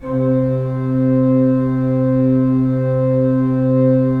3D Organs Pipe Mix Celeste

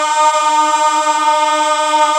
GOSP. AAAHH; Sound & Vision - Gigapack II CD 1 (Roland); HAL Gosp. Aaah 1

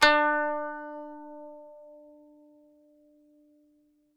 kanun D4; SoundBytes; Early Patches; KAN SING BIG

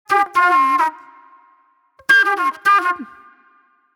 Raw Funk Flute

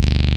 TALK SYN BASS; Pro-Rec - Synthsonic; BASSES

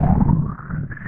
FX, Futurist Drum & Bass

